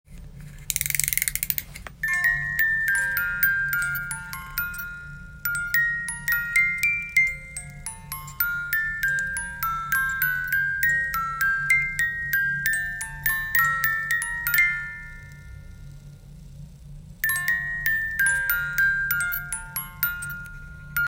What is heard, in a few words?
Lullaby